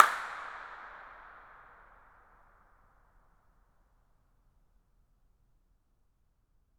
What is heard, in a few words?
incinerator dirac